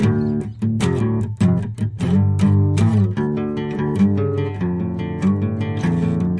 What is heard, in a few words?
150 the texan strummy guitar; GUITAR LOOPS